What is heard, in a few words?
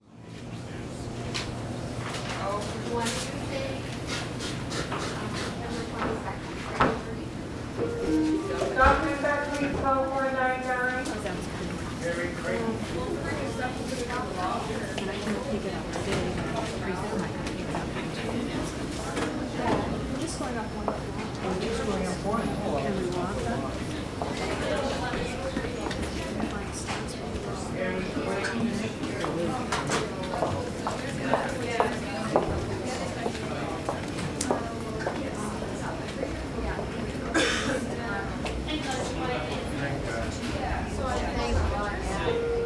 General Nurses Station Ambience with Pa Announcements; Waiting Room; merck